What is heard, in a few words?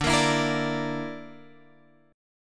D Suspended 2nd Sharp 5th D, Guitar, Drop D